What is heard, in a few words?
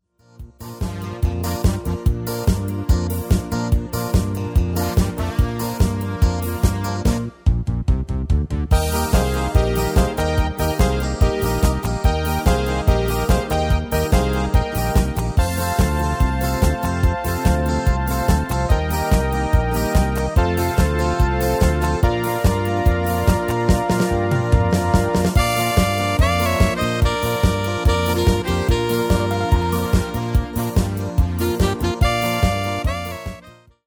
MIDI